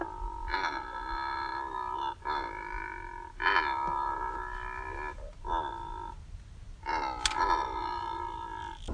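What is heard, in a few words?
sekretar, secretary bird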